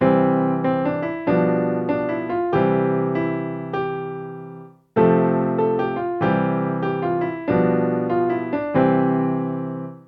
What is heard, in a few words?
triplet in c